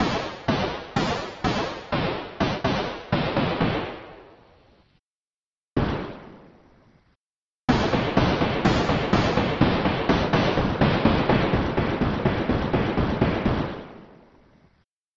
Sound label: gun shot